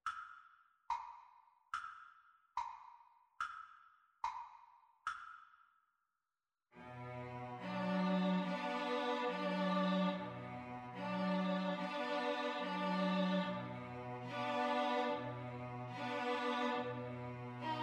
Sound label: bella ciao, 2 Violins Cello